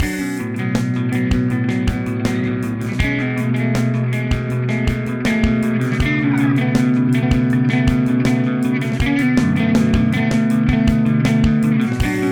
Walrus Audio Ages

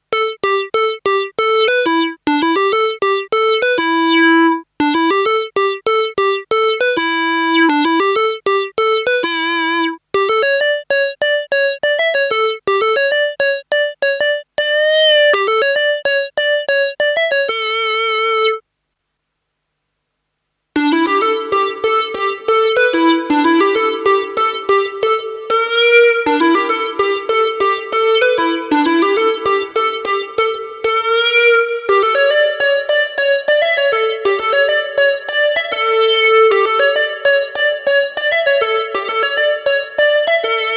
SY 1 Puls dry with Chorus and Reverb

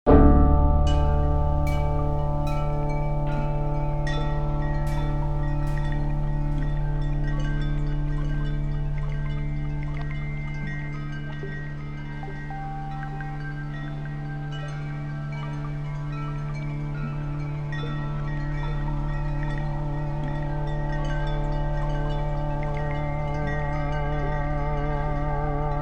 Sequential Prophet X Glass Garden

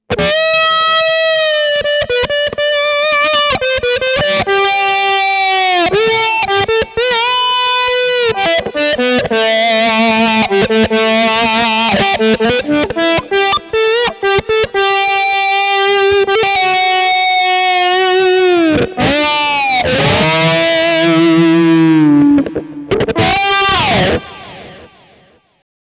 Auto Swell on Lead; Auto Quack

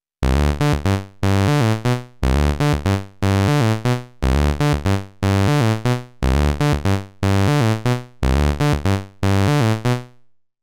Studio Electronics Quadnic Single Saw Seq

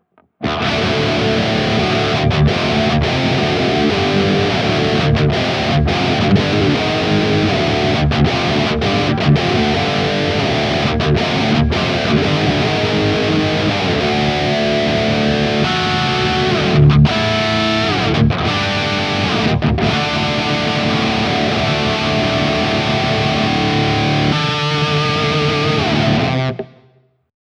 Cort X700 Bridge Humbucker Highgain Drop D

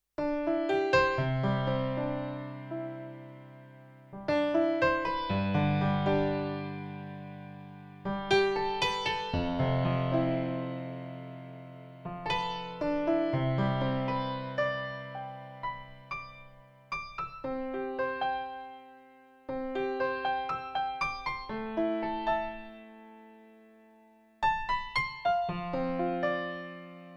Fortepiano

